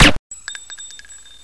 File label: silenced pistol; blobAndConquer; combat